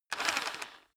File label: rock drill hammer rev short; bohrer; soundpool